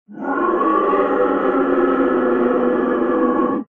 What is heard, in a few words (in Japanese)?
恐ろしい遠吠え